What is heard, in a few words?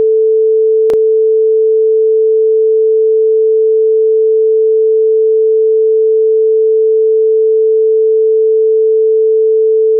Sine 440